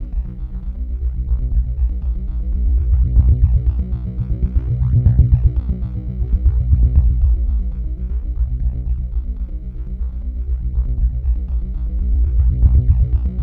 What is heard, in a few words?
SUB WAVE, Special FX Twisted